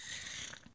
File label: snore; sm64coopdx; char-select-extra-chars; placeholder until the soundbank of the character voices is resolved